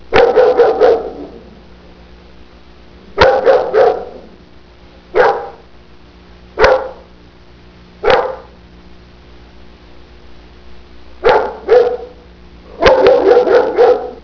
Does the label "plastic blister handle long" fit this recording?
No